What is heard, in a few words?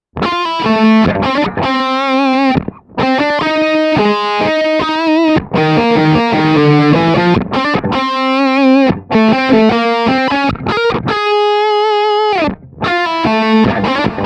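lead, Guitar Dry Sound – Guitar Wet Sound – Guitar + Band; USA ’80 LEAD (Mic Pre Neve); From 0″ Guitar Dry Sound – From 14″ Guitar Wet Sound – From 26″ Guitar + Band; Kemper Profile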